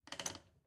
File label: Scarf Open; raw game audio files; Samo; MiSide